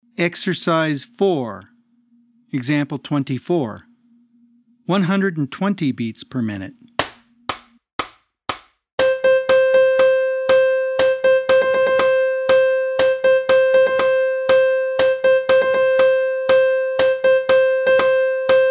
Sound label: 120 BPM